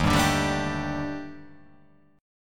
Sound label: D sharp Major 7th Flat 5th, down, chord